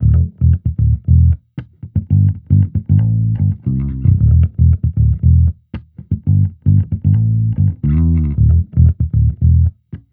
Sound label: Amped loop 115 E, Electric Bass Loops; Slate Digital BASSHOLE Sample Pack